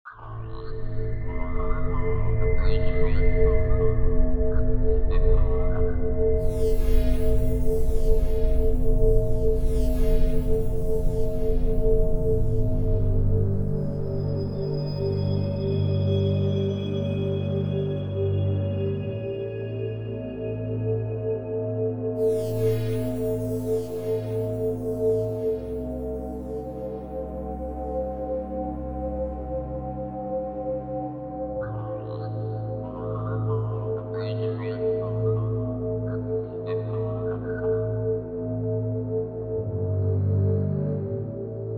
race; Galactic Civilizations II

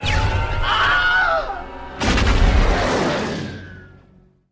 Die; lego star wars; Battle Over Coruscant

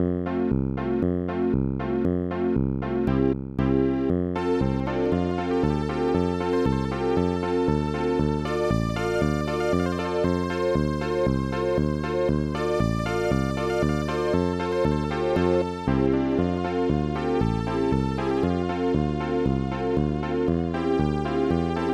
Karaoke; Kabuki Rocks; SNES

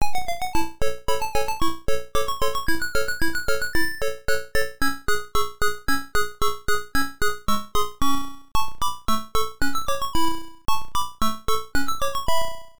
All Clear; arabian arcade

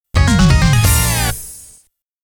Start Music; ordyne; shinji hosoe works vol.2

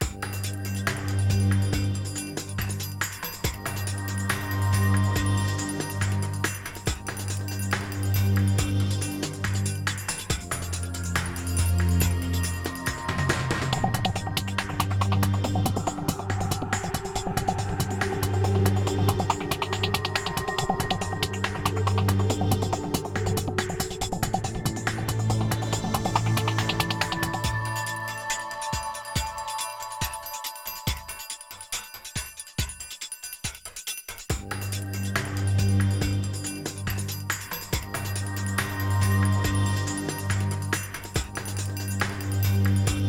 Stealth Loop; RDX

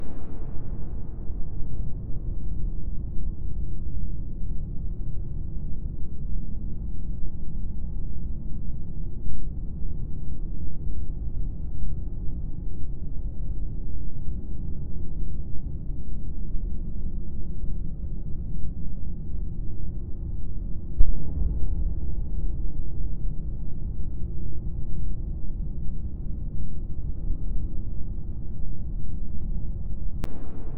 Menu Ambience; Funkin Against the Anomalies demo; windows

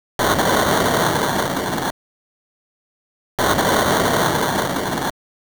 Boss Destruction

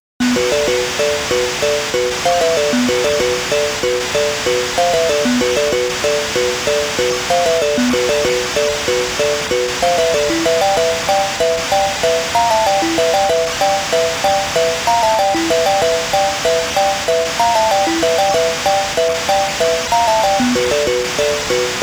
Waterfall; arcade disc in jaleco action

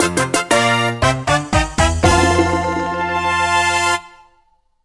Character Piece Goal; Mario Party Star Rush

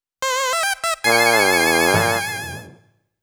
Defeat, Loss Jingle; Genso Suikoden II

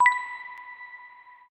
Blink

